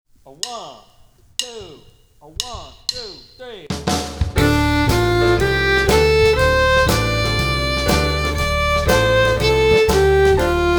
Play-Along D Minor Pentatonic Scale, One Octave, Three Times